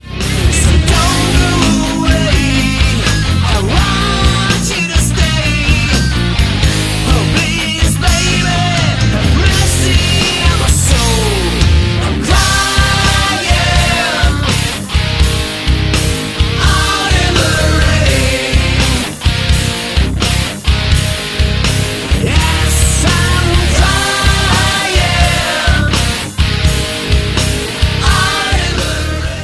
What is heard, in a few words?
crying out in the rain hook